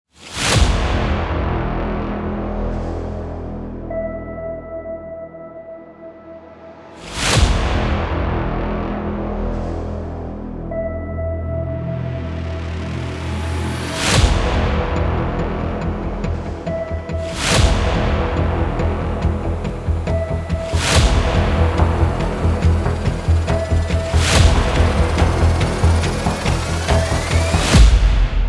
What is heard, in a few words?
superhero trailer